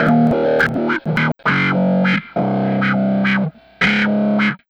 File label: Gitlike Metal, Leads And Riffs; Sonic Foundry (Sony Creative Software); Synchro Funk